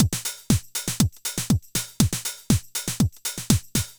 House Drum

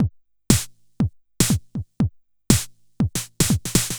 House Drum NoHH

